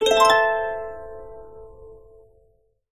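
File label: harp; Best Service; Peter Siedlaczek's Advanced Orchestra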